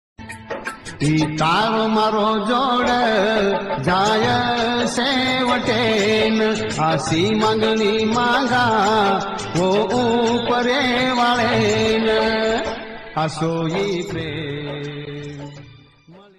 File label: banjara ringtone love ringtone